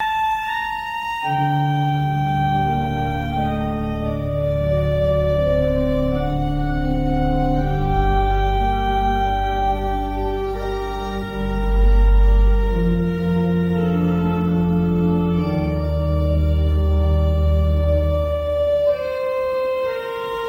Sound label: organ cathedral